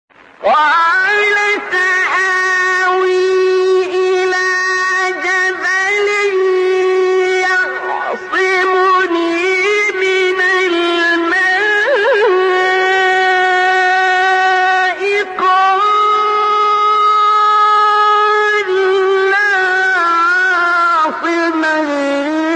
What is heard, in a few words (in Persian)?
تلاوت آیه سوره هود; 43; عبدالباسط